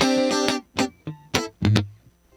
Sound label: Electric Guitar, Loops; Guitars